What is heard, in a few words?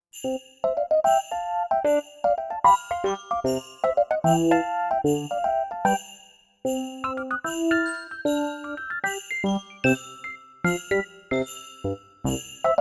Christmas Style